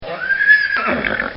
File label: Horse Whinny; Horses; Animals